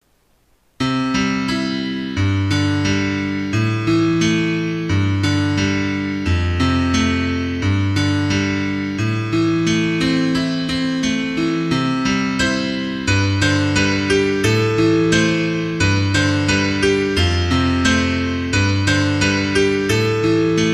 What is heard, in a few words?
lalala piano